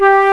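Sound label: woodwind G3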